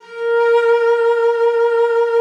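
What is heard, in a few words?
A#2, cello vibrato; Xsample VOL-7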